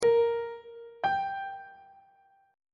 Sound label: relative minor of a sharp major is f double sharp on treble clef